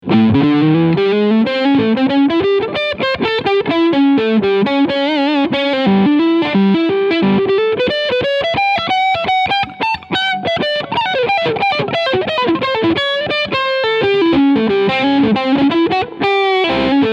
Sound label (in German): Gibson SG 61 Standard Maestro Overdrive beide Pickups